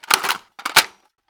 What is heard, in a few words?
tmp mag out